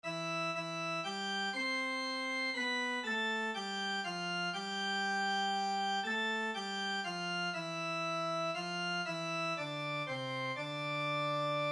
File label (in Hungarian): szopran